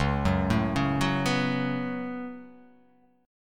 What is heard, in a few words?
C sharp 7th Suspended 4th Sharp 5th C sharp; down; chord shape